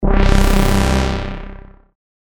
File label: bass shot C; Diesel; revealed bass shots vol 4